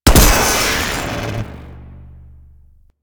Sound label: Electro Mag Charged Shot, Weapons; Warframe; Corpus